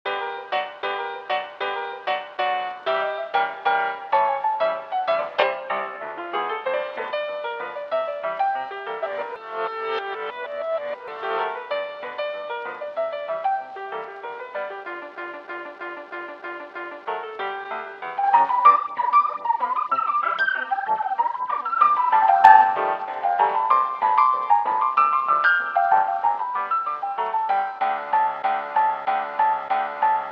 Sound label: Ragtime Broken; west of loathing